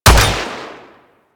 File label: Fire, Weapons; Gorgon; Warframe